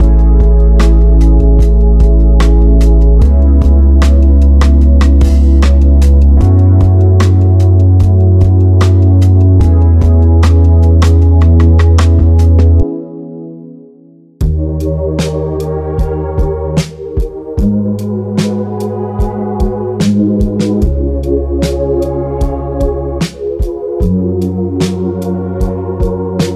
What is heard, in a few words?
soulful; Soulful Vibes MIDI Pack